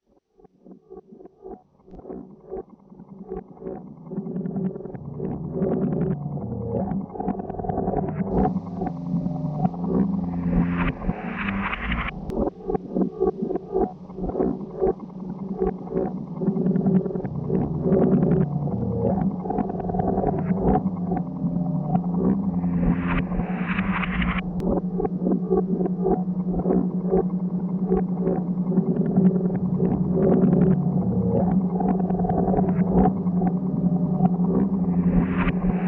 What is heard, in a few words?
noise research; high rise